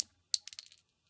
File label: casing fall, Guns; Weapons; Casings